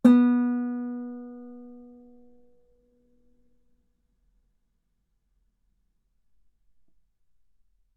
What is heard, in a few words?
Guitar B3; ff; sul B